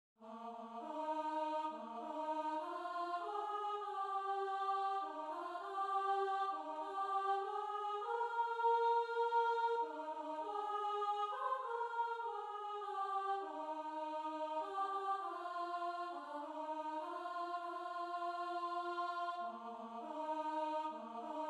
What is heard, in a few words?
Soprano